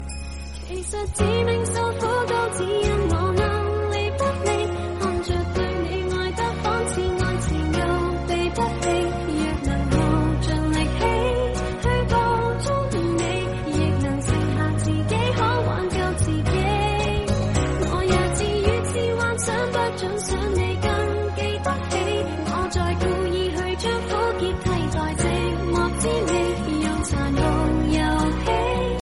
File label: soft music female english song tone ringtone